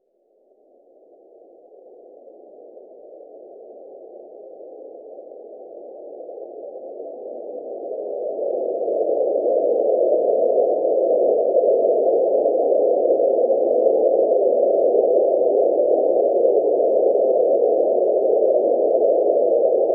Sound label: sun sound space